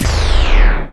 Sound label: FX DROP; E-Lab; X Static Goldmine 2; akai